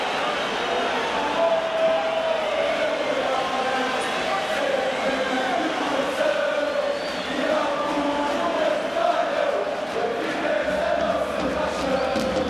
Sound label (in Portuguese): Diabos nunca te falham, Chants